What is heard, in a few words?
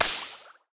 poison hit; smite; raijin